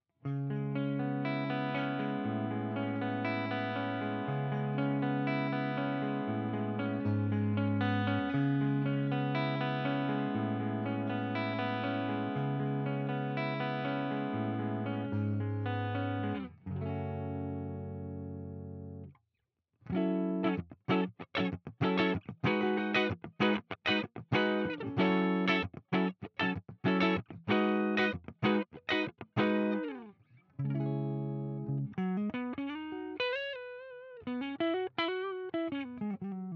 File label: Epiphone ES335 CLEAN neck